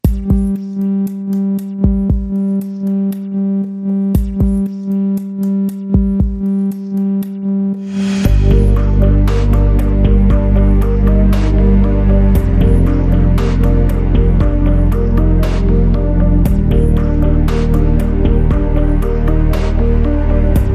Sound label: No Choir